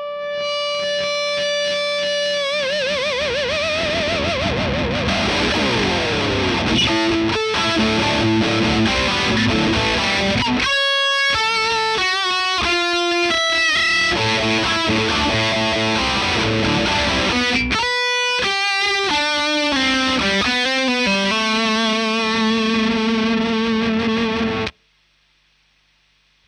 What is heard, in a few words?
Marshal Gtr Line